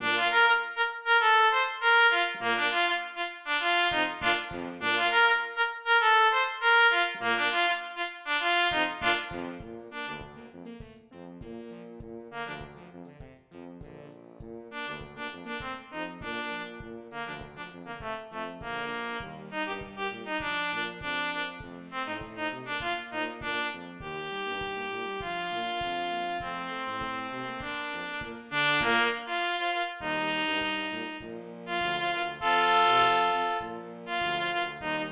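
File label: trumpet 2; Borgertog2019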